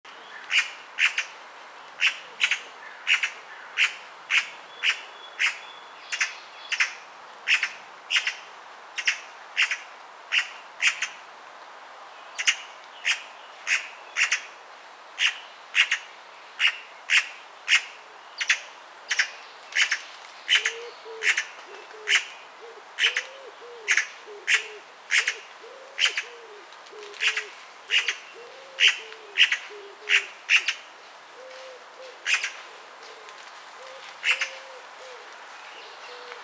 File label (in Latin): Pica pica; SETE